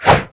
SWORD

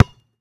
ball red Ball

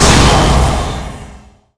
chaos of air

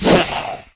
Attack

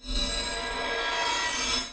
Effect Magic

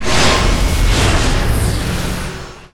Magic buff

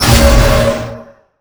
magic dispell

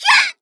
Attack Voice

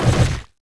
crab hit

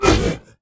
Hit

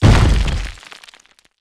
Fall Big

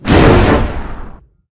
Whoosh

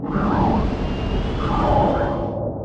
cast acidbolt

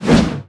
Whoosh

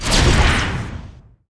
Fatal Blow

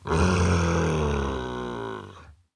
orc dead

